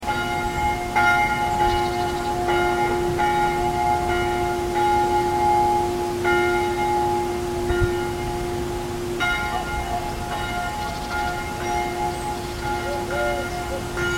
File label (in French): LUSSERAY 79 CLOCHE ENTERREMENT; EGLISE SAINT GEORGES; Sonnerie de cloche du 21/12/2022